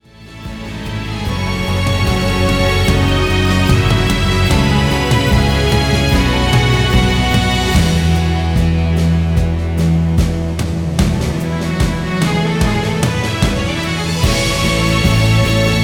Professional instrumental soundtrack; GLEE